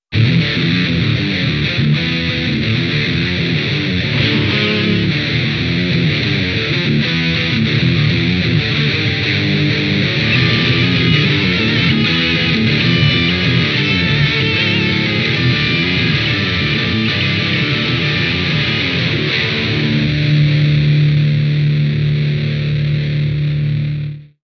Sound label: Maxon SM9Pro+ effect